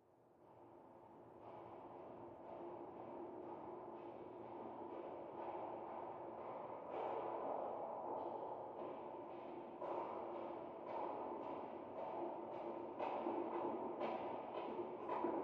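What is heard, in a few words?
Footsteps Female Walking Di, stairs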